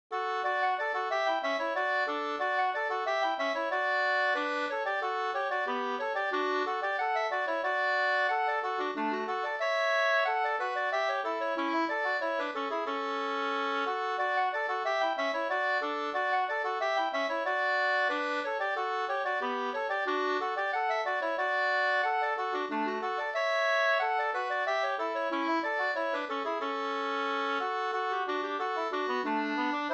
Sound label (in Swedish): slangpolska